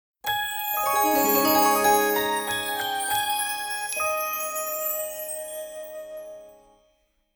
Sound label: magic music